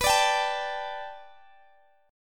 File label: B Minor 7th Sharp 5th B Guitar DADGAD, chord